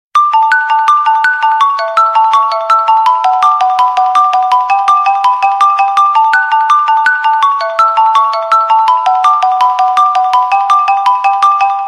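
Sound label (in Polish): Sony Xperia S, dzwonki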